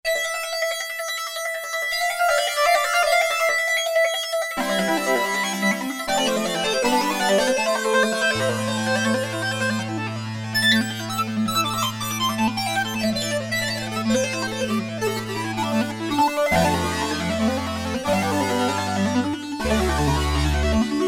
STEP SYNC, Roland SC-880; Factory Patches

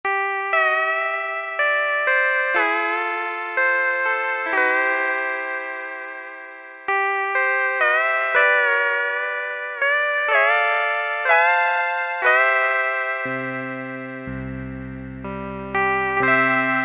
Yamaha TG500 GT pedal

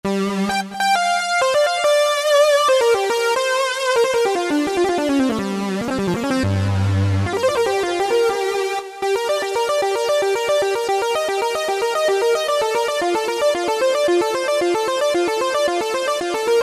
Mono Lead, Korg N364; Factory Prog demos

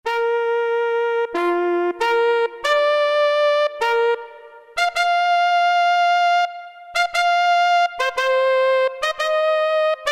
Trumpet, Roland MT-32

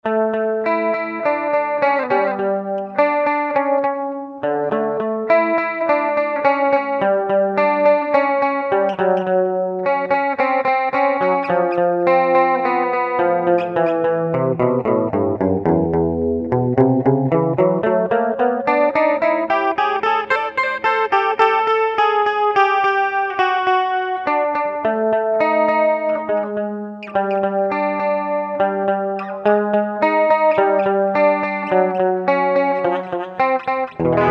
TRIPLETS; Digitech RP200; Factory Presets

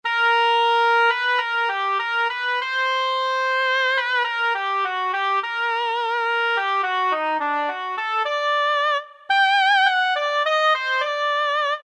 OBOE; Roland SN-U110-06

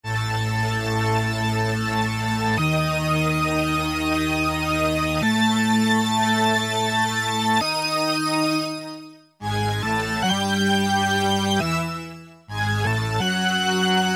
Kawai SX-240

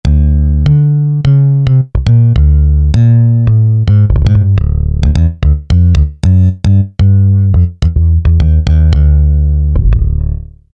Bass, Roland V-Synth; Factory Patches; 281 BassTryAfter

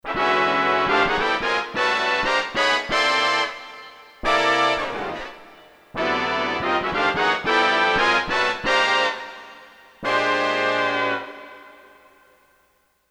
BigBand, Brass Hits; Korg DSS-1

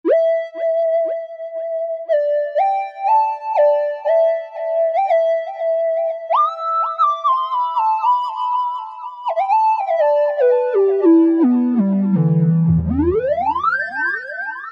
Soulful lead; Generalmusic Equinox

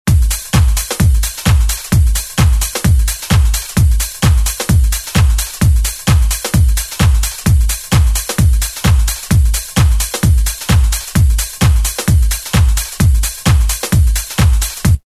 Fancy Sw 130; Roland M-DC1

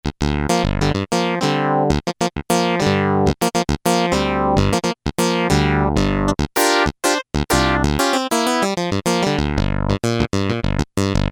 Synth Clav, Korg MS2000; Factory Patches

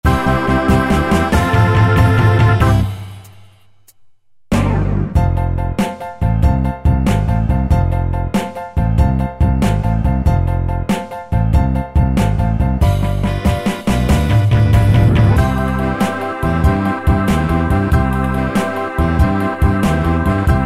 6-8 R&B, Yamaha QY70; Preset Styles

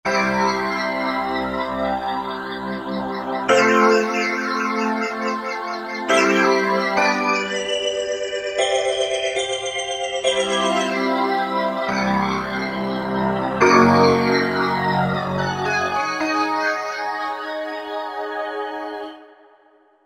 Optical Organ, Roland V-Synth; Factory Patches

